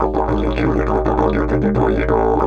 DIDGE 100BPM; Big Fish Audio - Didgeridoo and Other Primitive Instruments

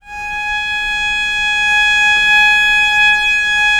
VL G#4, VIOLE, Historical Instruments; USB Soundscan vol.23

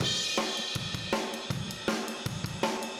Room M, Drums, 162 BPM; Drums from the Big Room The Mixes; Take Your Toms Off; Chorus 03

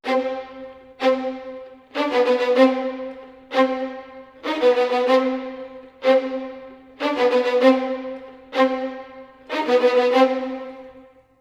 95 c min strings mid, Orchestral arrangements

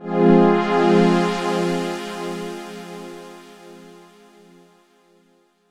F minor, Chords; Electronisounds

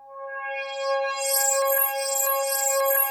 PPG TABLE C5, Analog Fantasies; USB Soundscan vol.49; AKAI

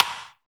CYBER, Drums; Drums In Your FACE!; CYBER PRC

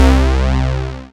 SYN ANALOG; Sound & Vision - Gigapack II

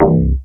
UPARTY C3, bass; Q Up Arts; Dream Experience

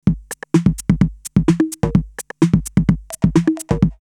808 Sticks 128, drumloops, DrumMachines; X-Static Goldmine

